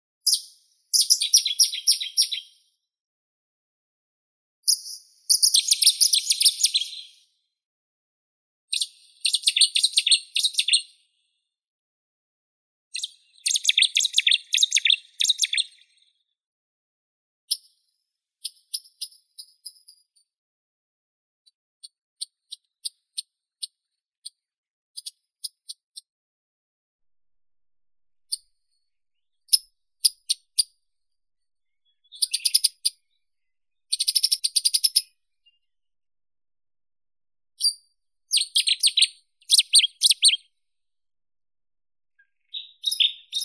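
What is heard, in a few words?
Cetti's warbler cettia cetti; shirokohvostka solovinaya; zvuki prirodi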